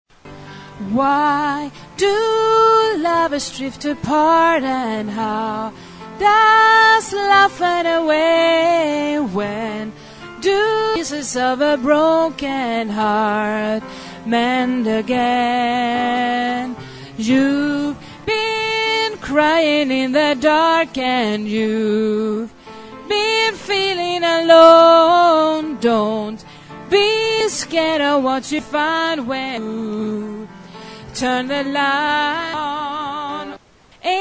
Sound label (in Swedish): sopran